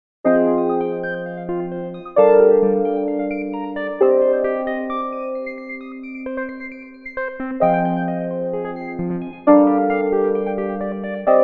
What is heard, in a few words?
Nord Stage 3 EP Arpeggiator